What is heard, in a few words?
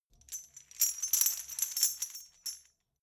metal chain handle clean